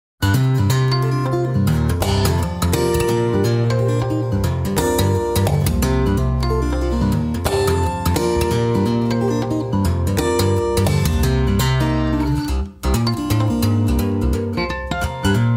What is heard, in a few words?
harmono slap